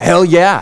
LAUGH; BattleSNDS

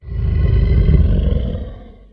Zombie Raise

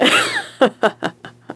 LAUGH; battlesnds

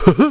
LAUGH; BattleSNDS

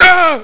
hit; BattleSNDS

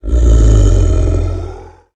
Zombie Raise

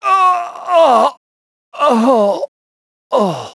DYING; BattleSNDS

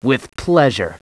LAUGH; BattleSNDS

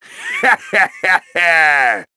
LAUGH; BattleSNDS

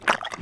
WATERSNAKE ATTACK

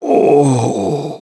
DYING

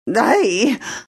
hit; BattleSNDS

